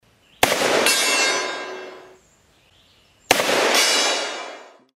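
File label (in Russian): кольт 45 по мет диску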